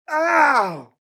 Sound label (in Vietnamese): Tiếng a edit video